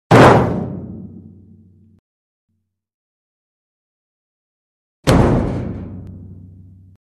Body Hits Metal Barrel Low & Sustained Medium Body F; Hollywood Edge Foley Sound Library